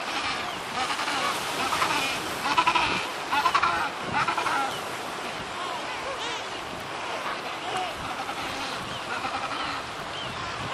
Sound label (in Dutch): adelie, pinguins